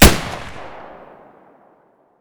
muzzle distant, weapons; vpo101

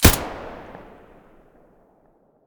aps muzzle mono; STALKER; IWP

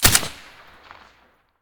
scar silencer mono

